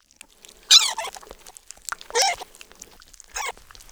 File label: idle, weapons